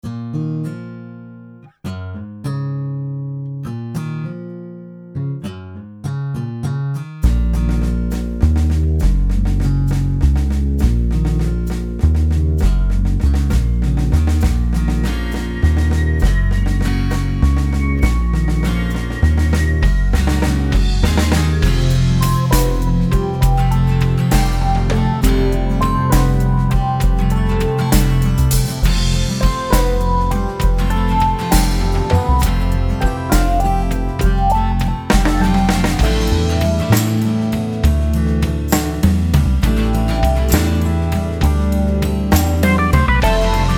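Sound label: Pop Ballade Single, drum play alongs; DPA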